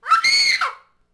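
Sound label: Settler Child Die Vox; Civ4 warlords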